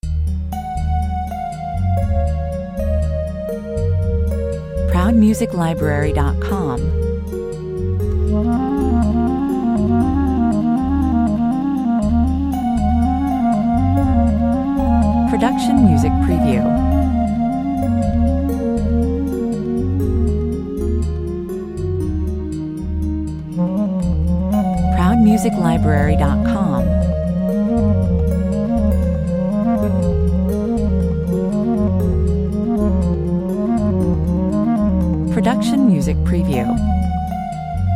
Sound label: Bright, sparkling synths tinkle and descend in amongst the swirling clarinet motifs